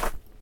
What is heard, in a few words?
snow step; Golden Crayon Sounds